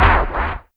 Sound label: scratch; Roland R-70; Perc